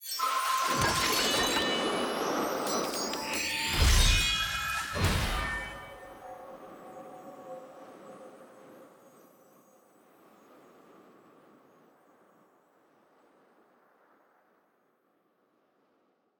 capsule tier 1 ante; clash; capsule tier 4

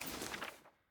sfx ui record next click; jayce

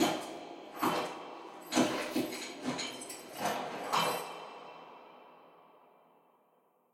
walk handstand enter phase

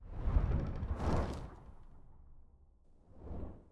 dragon transition scene; tft

